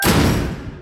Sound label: explosion; Vampyrslaktaren